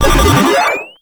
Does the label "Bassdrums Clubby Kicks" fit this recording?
No